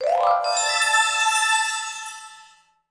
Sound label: Play Use Item; pangya fantasy golf pc